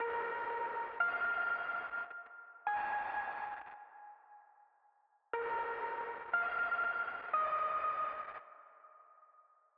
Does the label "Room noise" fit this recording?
No